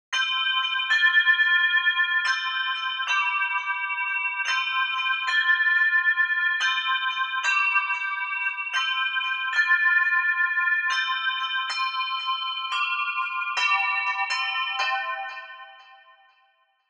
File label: Bell Chords, Orchestral Chimes; Sound Design; KONTAKT